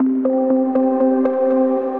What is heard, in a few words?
Copper Bells, Melodic Arps; Ableton; Mood Reel